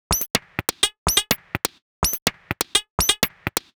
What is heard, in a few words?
Top 125bpm, House Drumloops